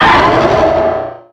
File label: pokemon cry